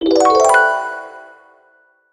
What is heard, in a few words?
Scroll Item; The Legend of Ninja; switch gamerip 2020